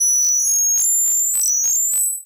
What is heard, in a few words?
Science Fiction Sci-Fi Electronic Readout